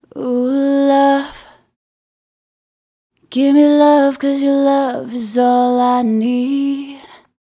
vocal hook short lead dry 135 A maj

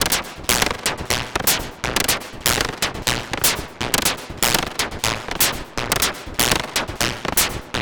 Synthloop 122.5BPM

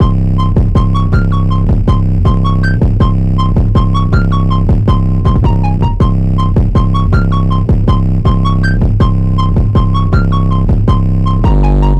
160 songstarter club twerk Cmin; trapas

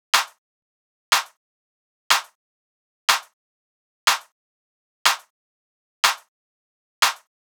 122bpm Clap; Love My House Kit 3

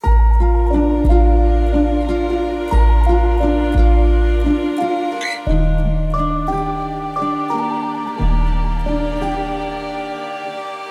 song starter 88 Am

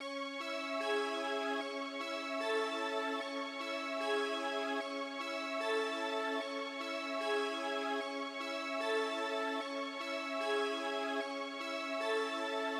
Pad

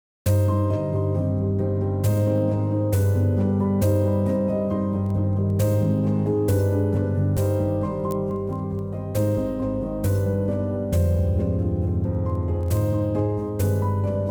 Thunder High melody BPM135 Cmin Mellow Sad

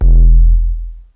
808 squared F

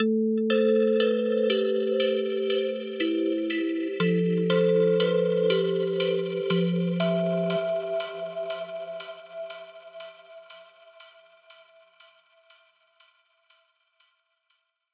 Melodic, Am, 120; Majority

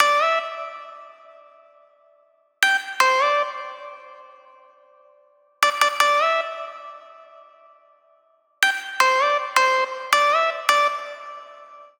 160 synth loop midi guitar Cmaj; ibiza; COUNTBALDOR

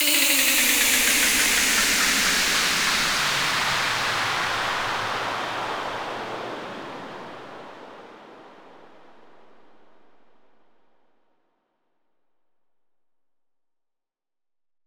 Sweep Down Vocal

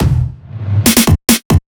fill 140

